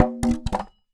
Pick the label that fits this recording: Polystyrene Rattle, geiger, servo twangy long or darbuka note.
Polystyrene Rattle